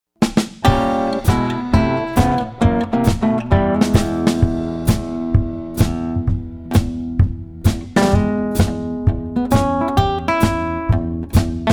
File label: Funky Chicken Blues